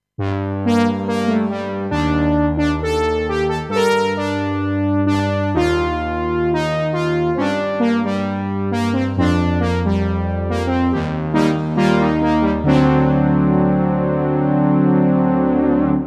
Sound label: Soft Lead